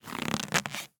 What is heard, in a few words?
Latex Glove; Blastwave